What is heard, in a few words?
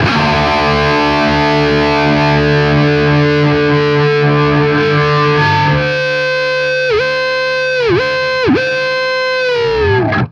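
power D cut, guitar power chord; East West; Steve Stevens Guitar Samples Collection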